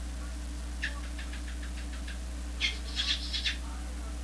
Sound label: kiss